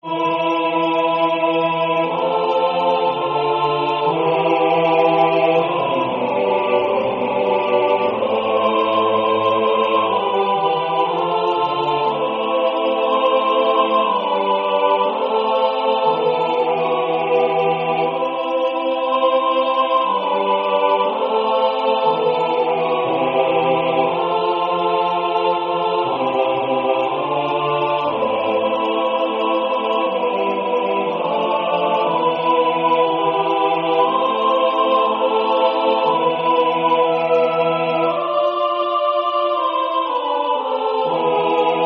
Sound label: Tutti; Mille Regretz